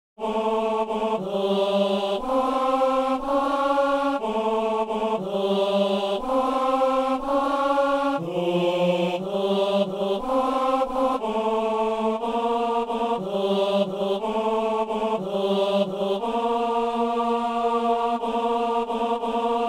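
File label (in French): messe simple gloria ténor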